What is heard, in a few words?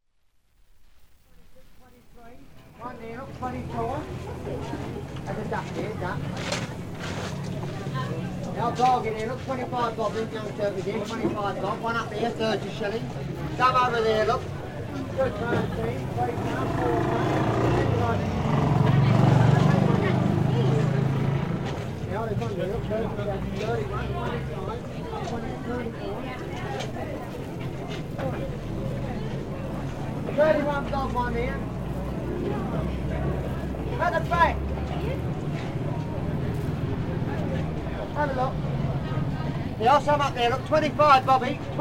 Butchers